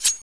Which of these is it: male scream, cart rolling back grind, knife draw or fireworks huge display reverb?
knife draw